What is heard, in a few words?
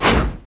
basicattack human; LoL; shyvana